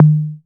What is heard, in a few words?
Synth Tom M, Visco SpaceDrum; Drum Samples